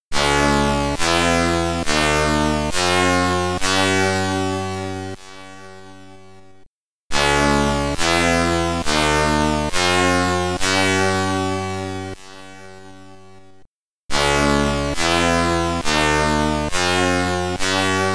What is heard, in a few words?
Boss SID Stereo; Gemini Wing; Commodore 64